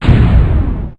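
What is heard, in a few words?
medium explosion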